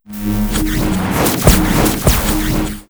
bolt main, monster

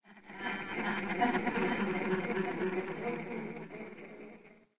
cemetery; portlux

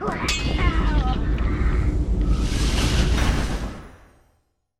elder demon death, monster

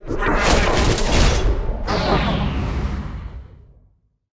dead, monster

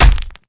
hit bone

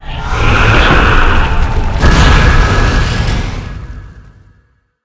barlog dead, monster

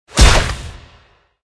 night member attack, monster

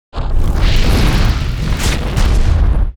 Ancient Dragon, monster

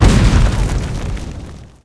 skill explo

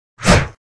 attk

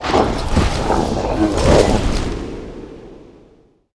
night officer dead, monster

